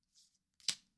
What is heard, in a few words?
move card in hand